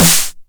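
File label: 909 SD Tape, snare drum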